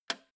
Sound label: old switch harsh snap